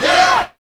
VOCALS; Ueberschall; Jam Box

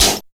PAPR SN, Phat & Phunky; East West - Phat & Phunky CD2; 90's Sample CDs

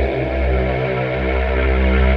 H.VOX C 1, ENSMBL; AKAI S-Series CD-ROM Sound Library VOL-8

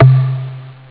block; ST-XX Amiga Soundtracker Sample Packs

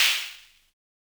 Snare, Univox SR-95

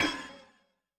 DOOMED; Yamaha DTXpress-II

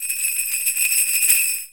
SUZU, JAPAN PERC; AKAI S6000 CD-ROM; WorldSounds

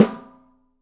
OPEN PICOLO, 3D Drums

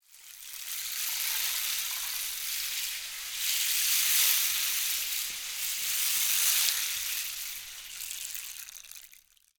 RAIN STK, RAINSTIKS; Spectrasonics; Heart of Africa 1

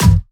Percussions

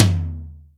DRY, Acoustic Tom; Yamaha DTXpress

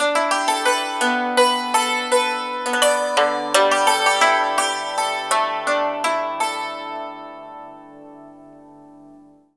YANG CHING, Heart of Asia; Spectrasonics

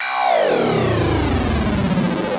Monotron Sci-Fi

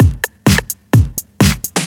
Straight Loop 128 BPM, House

